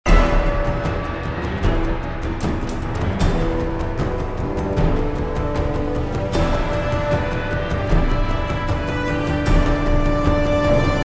mix paralel compression yes, With parallel compression; With parallel compression (listen how the drum section 'thickens'!); epic orchestral track